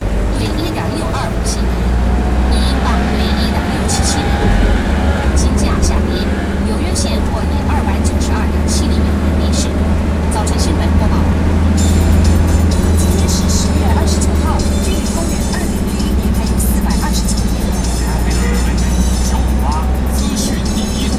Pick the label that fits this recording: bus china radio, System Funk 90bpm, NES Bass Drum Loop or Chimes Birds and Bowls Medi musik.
bus china radio